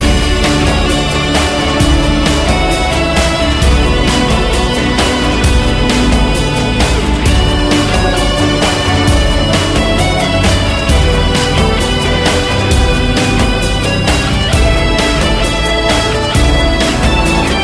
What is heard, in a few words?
Victory Music